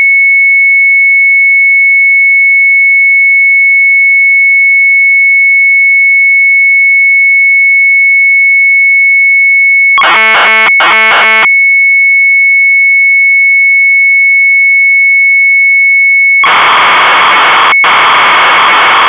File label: Sharp MZ800 Tape; USR-RS232; retromelsarchive